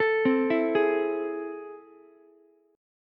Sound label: A Minor Major 7th, down, Ukulele; arpeggiated; AmM7; JGuitar